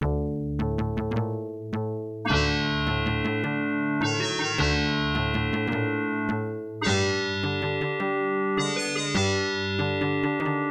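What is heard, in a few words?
sb 15 ct1320 (adlib driver); soundcard test; Soundcard test page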